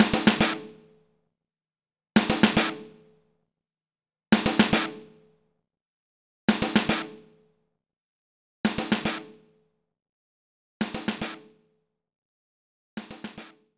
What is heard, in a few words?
Lava lair Fill; Sly Cooper and the Thievius Raccoonus 2010 PS3